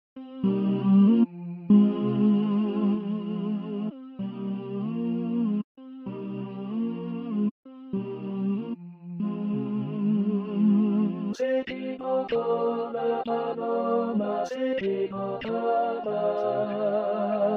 Tenor

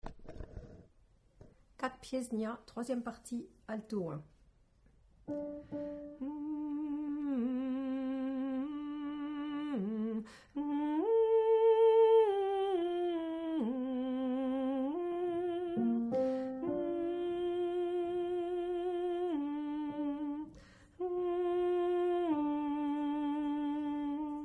Alto

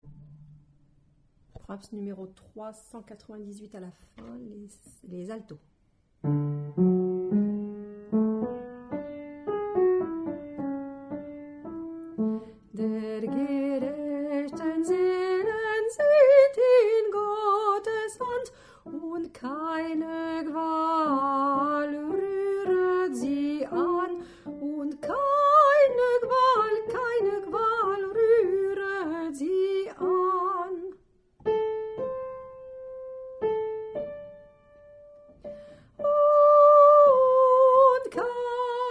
fin Alto

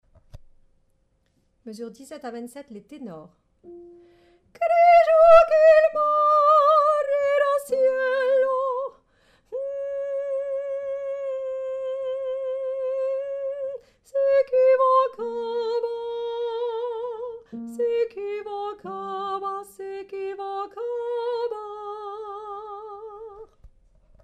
paloma Tenor

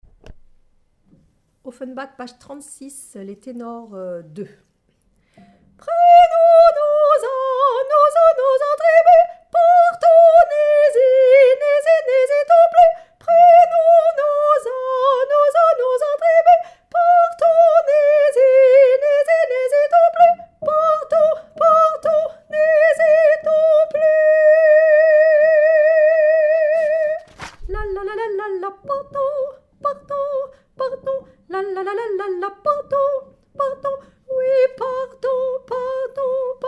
Tenor

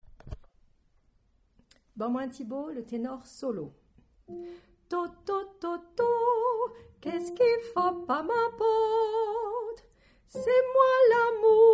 Tenor Solo

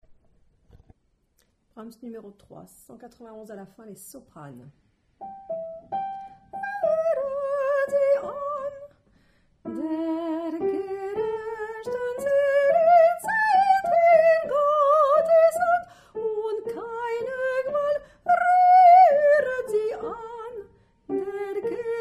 fin Soprano

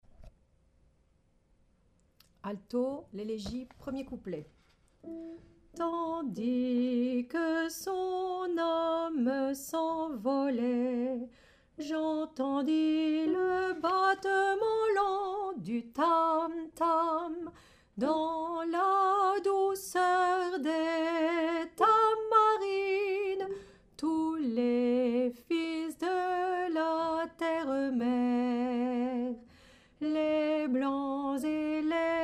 Alto

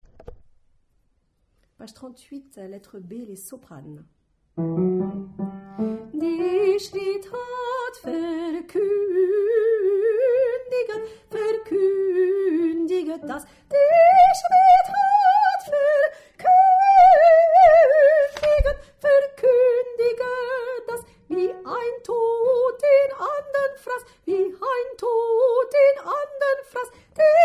Soprano

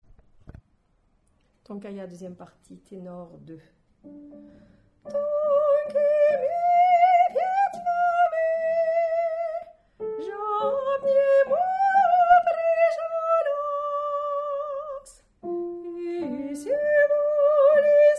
Tenor

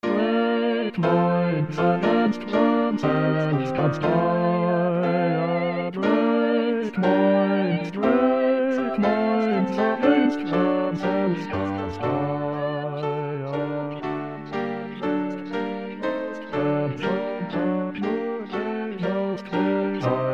great minds Basse; Purcell - Didon & Enée

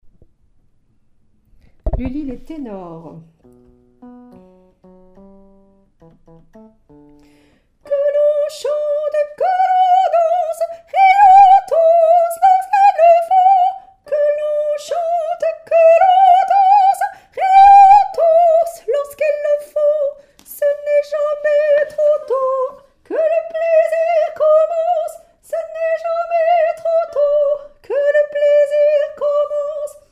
Tenor; atys

